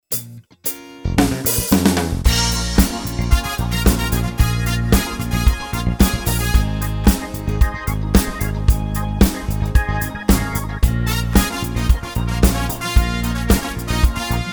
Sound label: soul organ